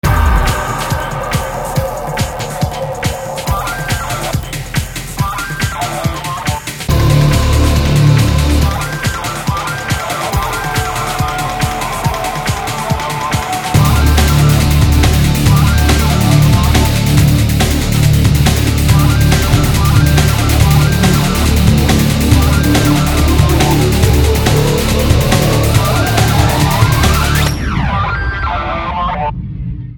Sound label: techno